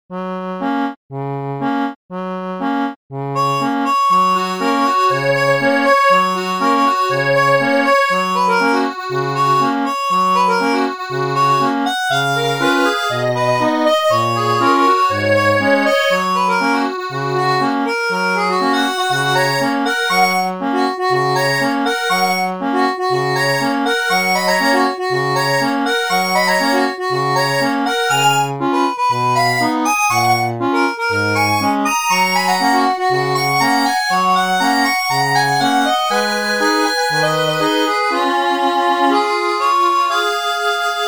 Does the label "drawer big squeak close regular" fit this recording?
No